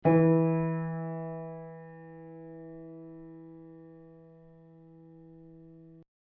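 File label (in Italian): Mi2 orig, martello originale Pleyel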